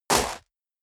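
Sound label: step leather shoes gravel medium jump, footsteps; schritte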